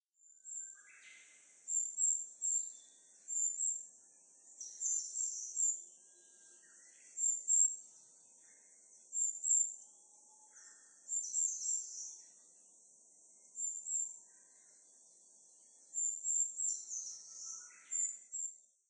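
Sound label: Eurasian Treecreeper, nasushiobara; kibashiri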